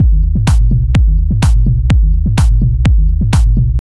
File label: tech groove bass kick loop; 126 bpm